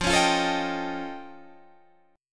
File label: F Augmented 9th F Guitar Open D, chord